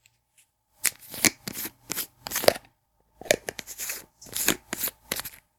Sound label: Plastic Lid Open And Close